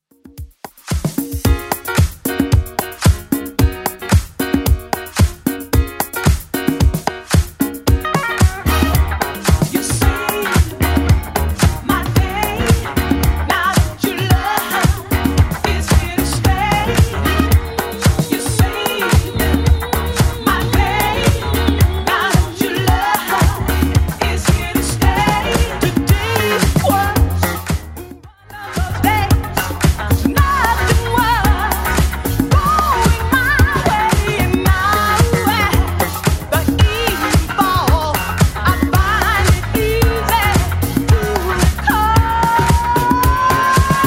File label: 80s Club 112bpm; 80s Club Edit